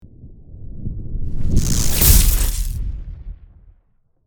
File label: Explosive electrical impact explosion shockwave